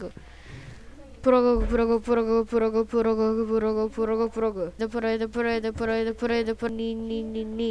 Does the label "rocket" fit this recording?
No